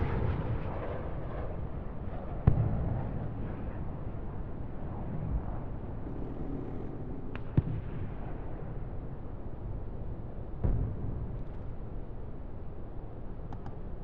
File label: amb training ground int half open; Titanfall 2